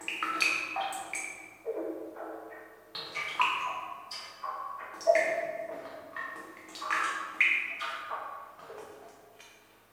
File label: Cave Drops; kult